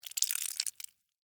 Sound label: Blood Spill Drip, Horror, Blood, Spill, Drip; Blood and Guts; BlastWave